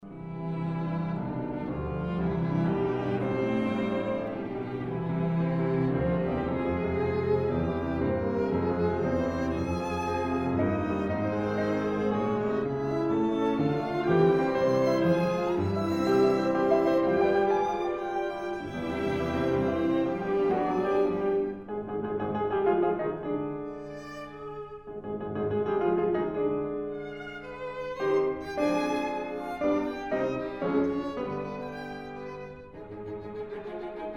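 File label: piano quintet; kiel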